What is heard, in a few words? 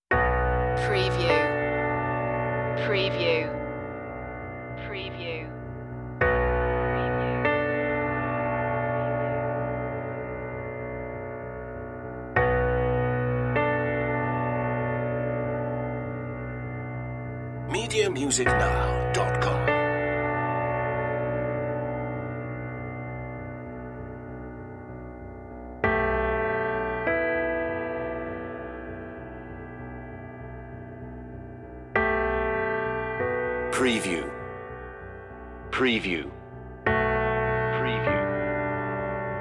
revenge the plot piano only